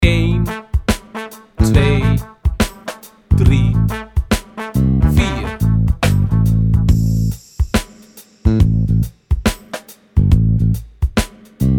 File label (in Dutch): Tempo Level 1 3x8, bodypercussie